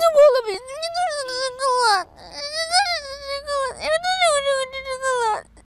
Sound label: russian boy crying